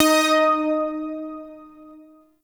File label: Shawzin Oct Three D Sharp; Music; Warframe; Zariman